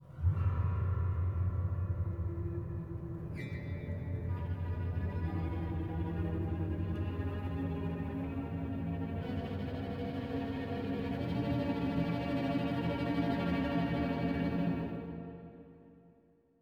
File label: cellar door; the evil dead score